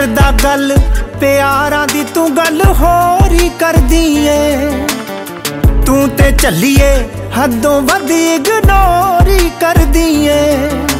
punjabi ringtone

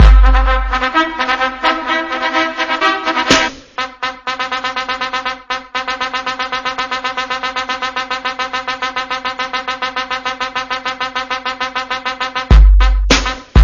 funk remix ringtone

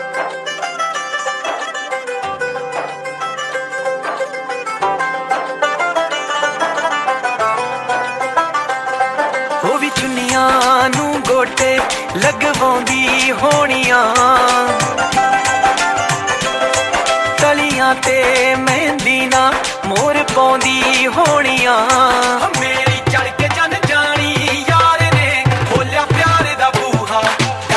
dhol remix ringtone

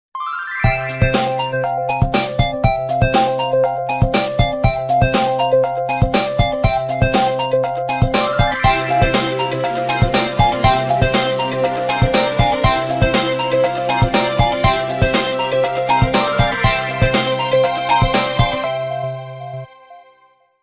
soft bell ringtone

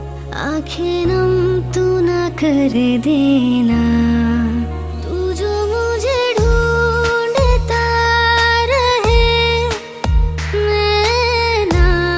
ringtone, bollywood ringtones

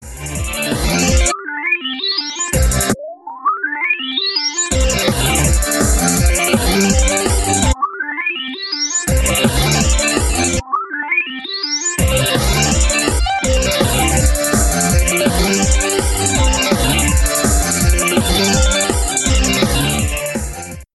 pixel ringtone

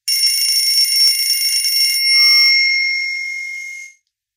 nokia alarm ringtone

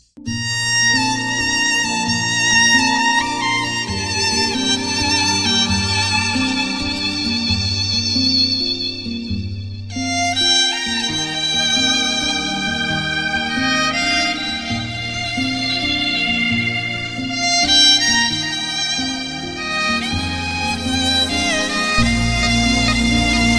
sad Bollywood instrumental song tone ringtone

